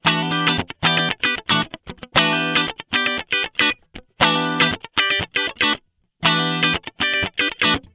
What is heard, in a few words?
Les Paul Tone Chandler EMI REDD47